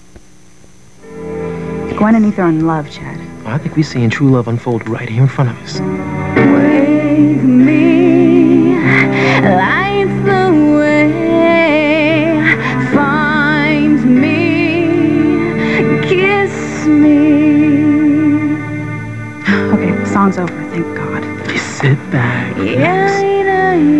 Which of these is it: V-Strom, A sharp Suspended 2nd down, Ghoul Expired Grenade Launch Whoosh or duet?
duet